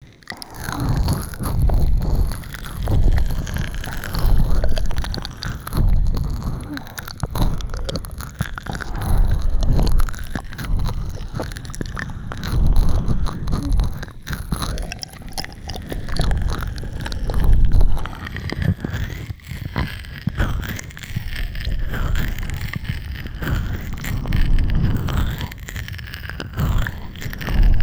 両耳舐めぐっぽりMIX

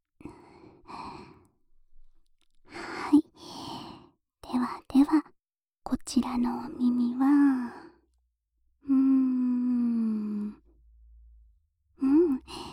お耳の奥まで……くちゅくちゅしちゃいますね♪（左耳の耳舐め）, ASMR

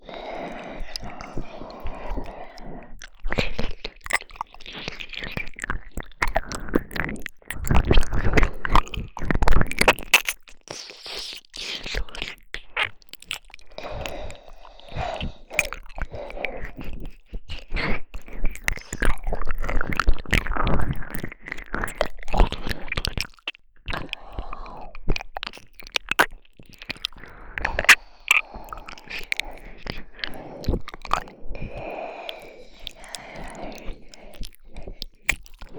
耳舐めのみ（両耳）

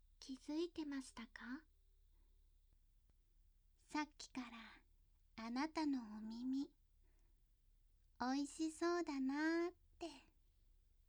耳舐め

